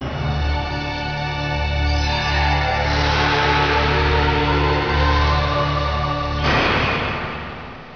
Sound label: intro music; Exile III v1.0